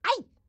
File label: grandma short yell; Blind Drive